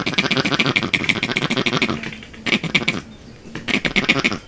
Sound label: Vervet monkeys Snake Alarm Call; vocalisations; compcog